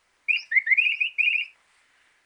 nokia3100 ringtone

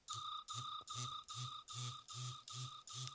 nokia 6100 ringtones cicada

